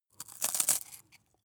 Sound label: bite biscuit